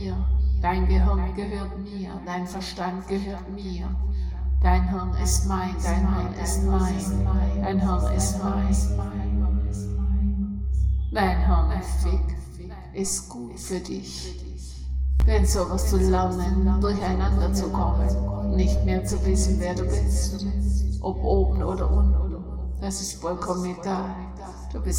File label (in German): Binaurale Beats; Demuetigung